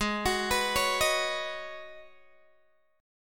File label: G sharp Minor 11th G sharp Guitar Open G, chord